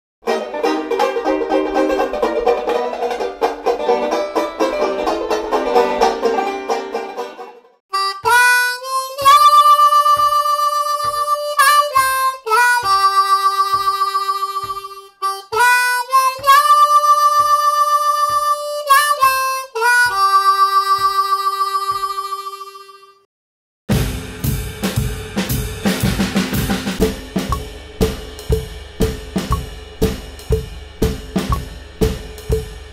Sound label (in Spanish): Instrumentos banjo armonica bateria saxofon